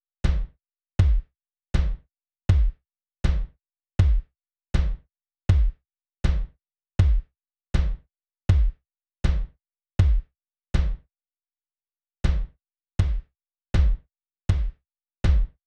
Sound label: lounge kicks